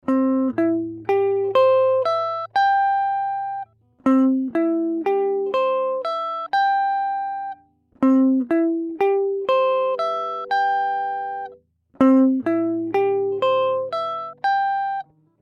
Gitarre Arpeggio

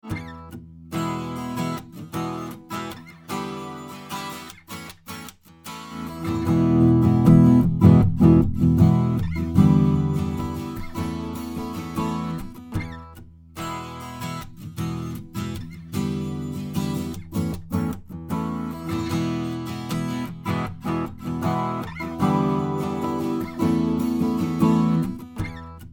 Taylor12 Mono EQ Alle Bänder cut; Great River Harrison32

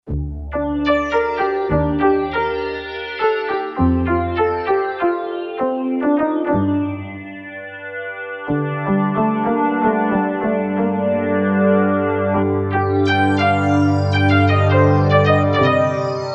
additiv

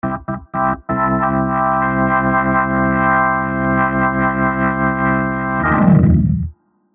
Speakerphone Leslie und Speaker

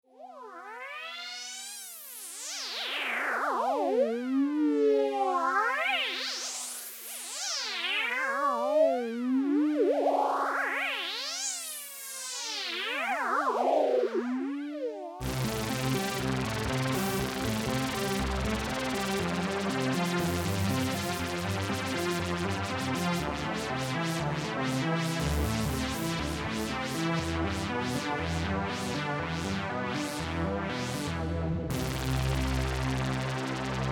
Beispiele LFO Mix

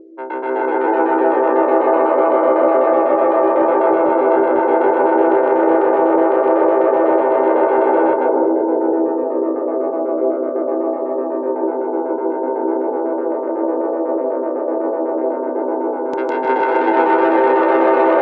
Moogerfooger Fx Tone Optionen; Amazona Moogerfooger Workshop

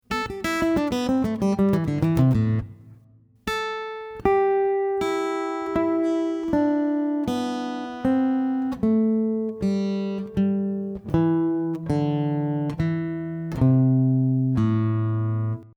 A-Moll-Pentatonik Akk

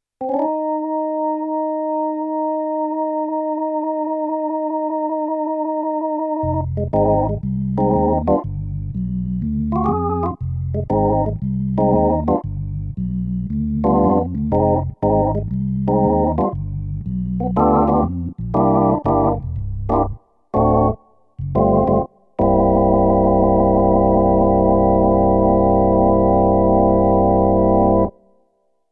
Roland FP-30X Orgel

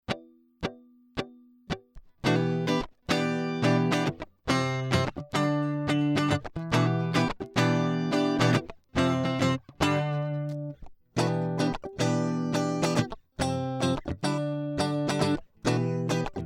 High Z In Strat alle 5 Toggle Switch Positionen sonst flat; Millennia HV35

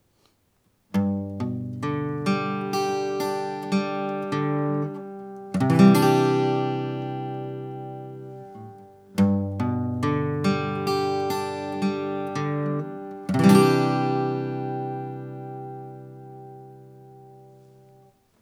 Sennheiser e865 30cm Gitarre

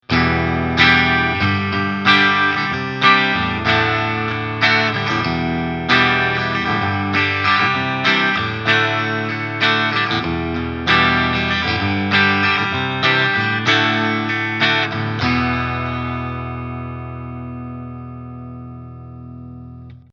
Steg Strumming

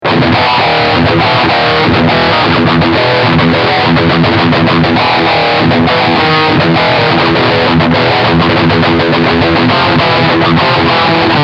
Riff Steg Pickup II

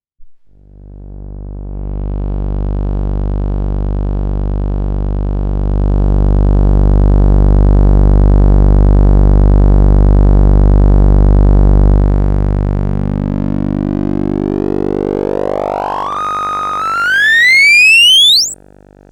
VCA Feedback Deform Sägezahn

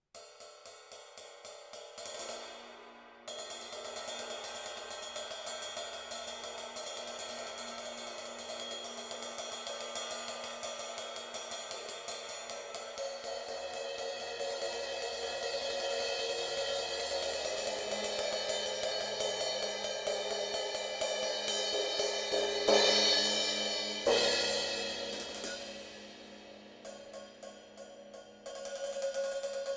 DPA Schlagzeugmikrofone Becken

